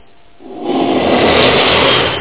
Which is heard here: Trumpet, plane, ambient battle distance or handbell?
plane